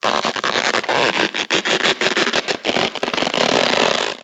Stretch Sound

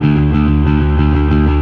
Fuzz D1; Torchlight III; PS4

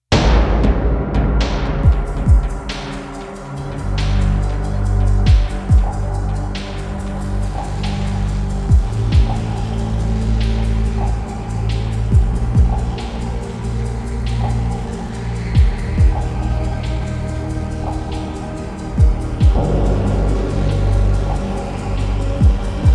Loading Music; Avarice

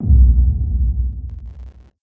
Mono Bass Drum; Mort; beyond good evil

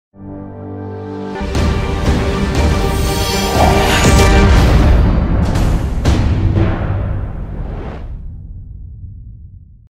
Cinematic Music; Town of Salem 2; Hex Bomb

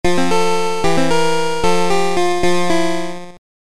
Word Match Round Transition; king james version gb gamerip 1994

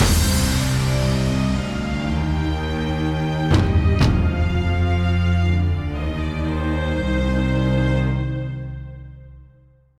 mood Bosque

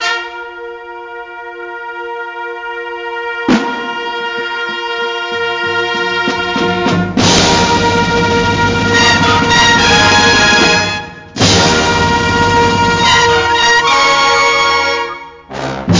Arcade Music; NCAA March Madness 2000; PS1

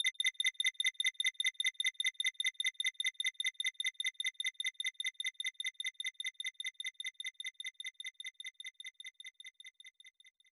Break Rock Guide Right

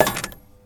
Coinhit; blastmorph pinball; xbox series xs

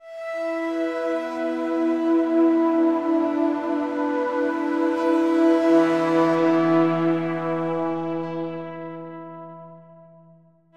Synth E Long; torchlight iii; ps4

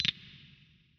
Falling

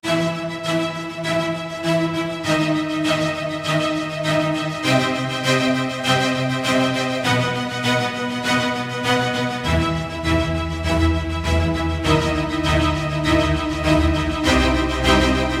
Epic Battle No Drums; Dual Blade: Battle of the Female Ninja

